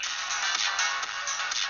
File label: intense phonk